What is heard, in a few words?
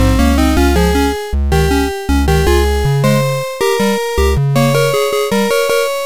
bassline; procjam